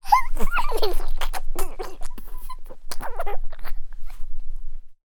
human baby toddler male 15 months laugh; sound effects one

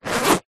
pouch small zipper zip; zapsplat; foley

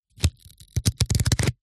office business cards wad flick through

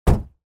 car bonnet or door close 10 feet distance

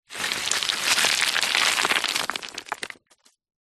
foley stones rocks small landslide fall down; zapsplat

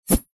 sword swing fast whoosh blade ring; zapsplat

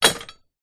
metal pole small lean against wall; foley

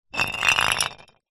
food drink beer bottle full roll concrete ground; zapsplat

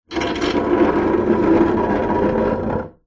chair dining heavy metal scrape drag wood floor; household

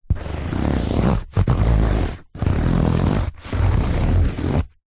office pencil strokes on cardboard sheet

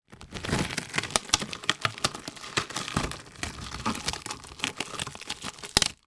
sport swimming cap latex put on; sound effects one

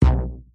foley rubber band ping low pitched loose hard

